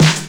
00s smooth bottom end steel snare drum d sharp key